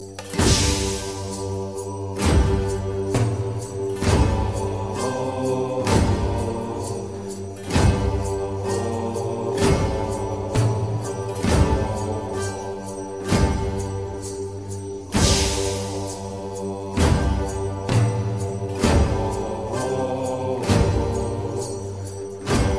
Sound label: Funeral Music